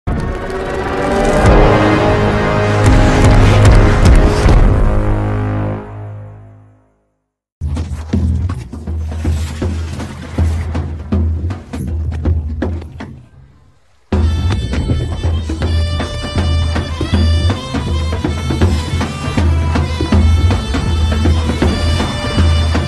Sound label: devara bgm; ntr